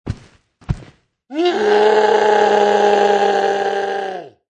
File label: Creature War Cry, Monster creature or fantasy animal, takes two footsteps; stops and howling a war cry; Lynne Music